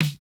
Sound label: no effect j city pop 1980 snare